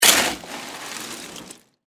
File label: Ice Chest Scoop, Restaurant; Industry; Miscellaneous Bar; Ice, Single